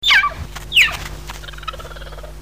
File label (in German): ruf, Geparden